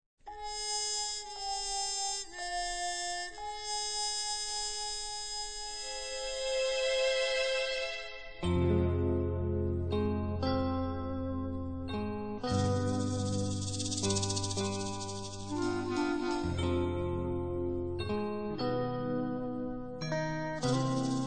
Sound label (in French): Musique d'attente